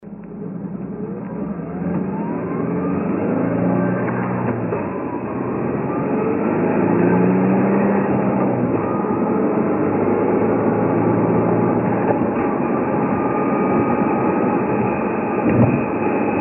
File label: 6MT gear noise normal, tranny noise; engine drivetrain and forced induction